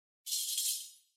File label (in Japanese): しゃら